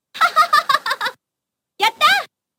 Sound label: celeb; Super Street Fighter II